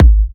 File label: G#, kicks; markus hakala kicks